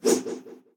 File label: slashes; SyrinScape; Temple of Urgathoa